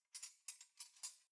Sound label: cutlery knives handle very soft